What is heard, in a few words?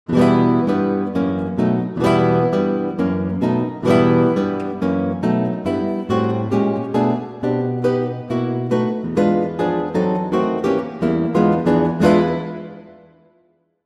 guitar; pianoteq8